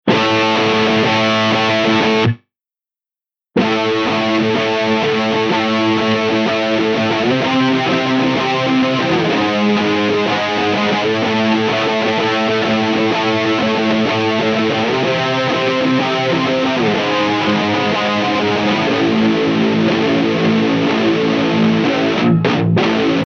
chorus; Mobius

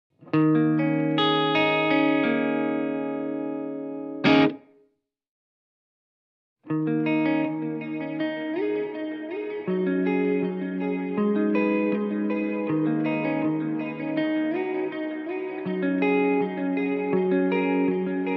Slow Layering; TimeLine MX

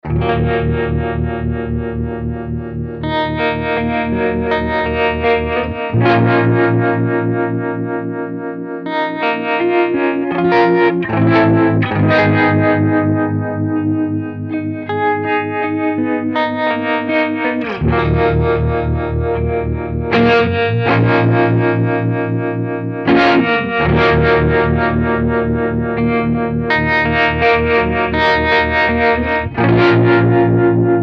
vintage trem; mobius

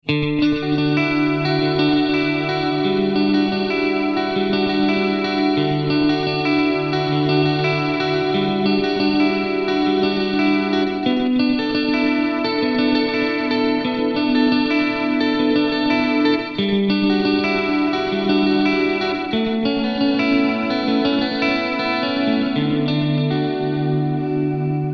80s Pop Arp; Strymon Compadre → Strymon Iridium → Strymon DIG

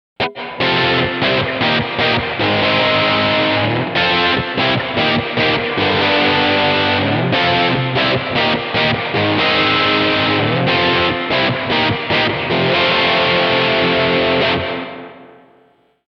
plate EQ; BigSky; reverb

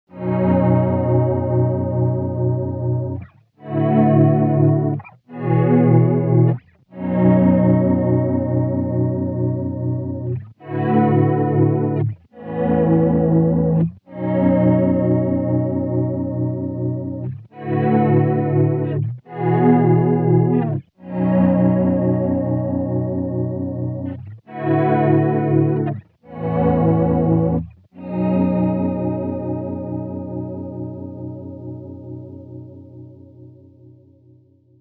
auto swell, mobius

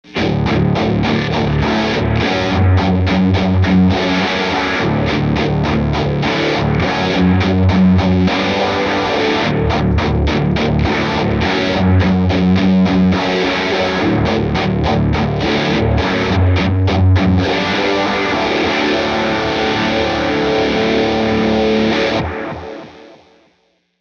Humongous; A slower Speed sets the motion for this deep vibrato-phase effect on a huge guitar tone.; Way Back Machine.

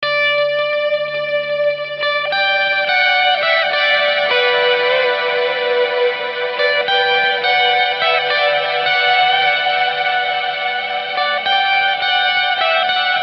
guitar outro lead

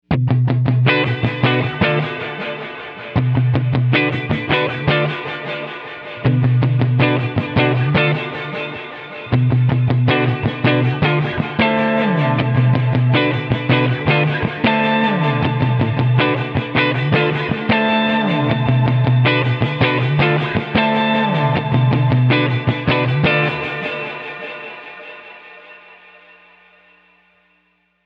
magneto; BigSky

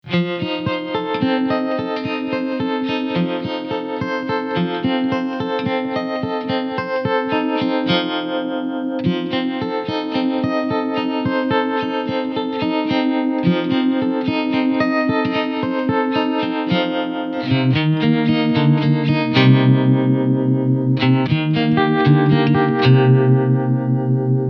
vintage trem; mobius

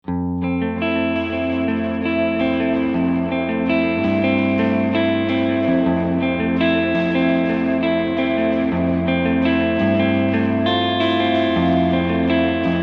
tape, timeline

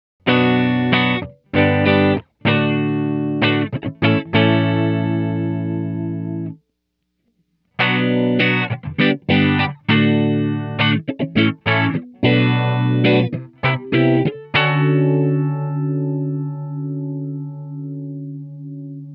phaser; mobius; second phase

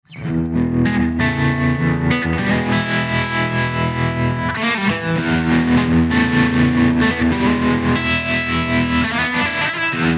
Southern Rooms; Strymon Sunset → Strymon Iridium → Strymon Flint

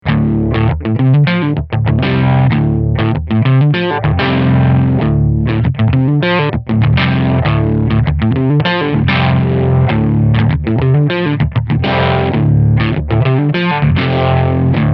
vibe; mobius

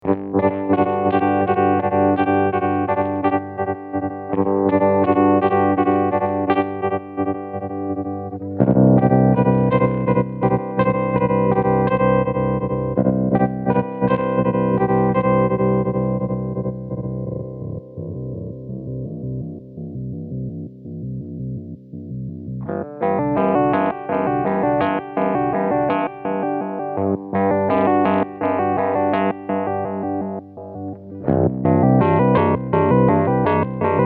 quadrature, mobius

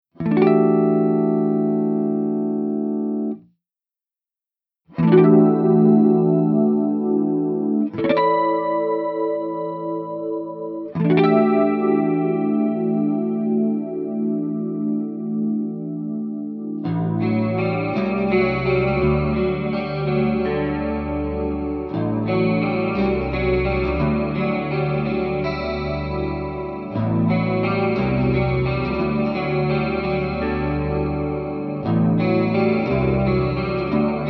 chorus; Mobius

